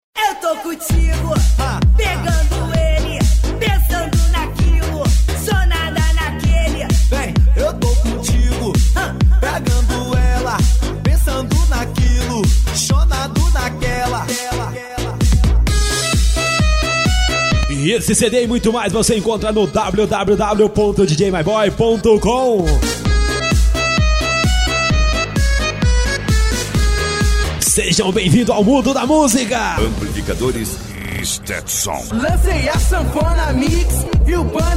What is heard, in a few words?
electro funk